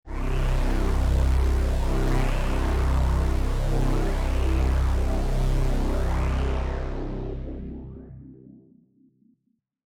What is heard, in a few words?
Tech Synth C1, techno 120bpm; musicradar; Synth P Multi